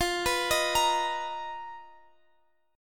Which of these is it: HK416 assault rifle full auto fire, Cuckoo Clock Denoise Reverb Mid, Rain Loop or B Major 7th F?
B Major 7th F